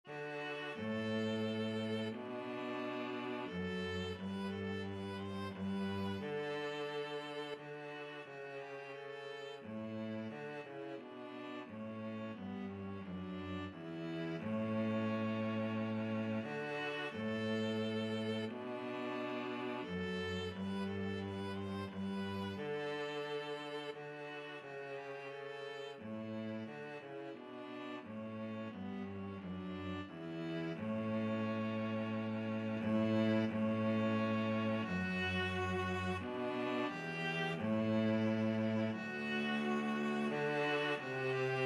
fairest isle, viola cello duet

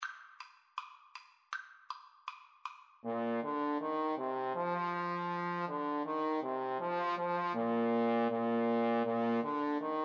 ding dong, trombone duet

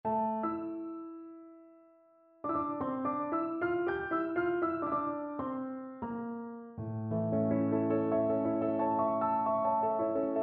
preludio, piano

